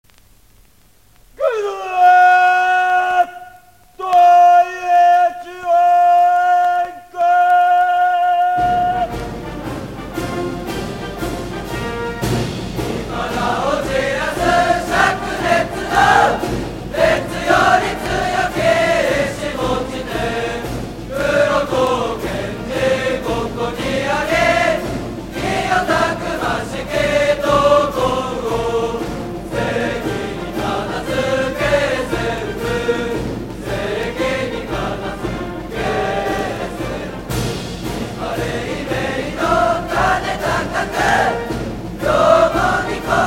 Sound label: cheering song